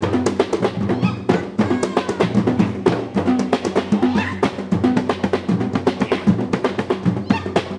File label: MIX, India; Best Service; Ethnic Journey Sound Cube